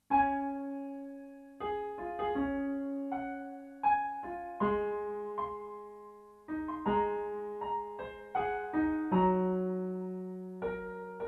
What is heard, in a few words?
piano